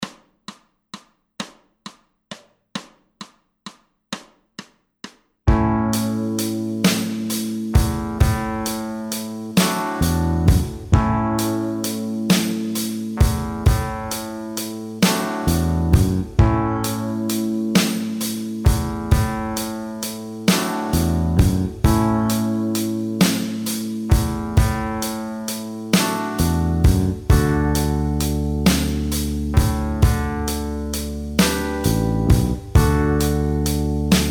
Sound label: Blues backing; Guitar Techniques 2017 Issue 272 August